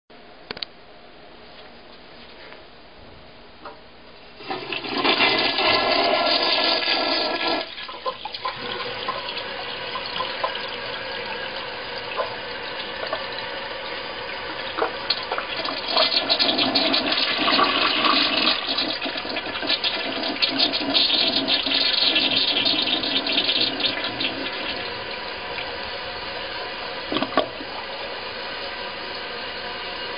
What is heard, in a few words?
flush, toilet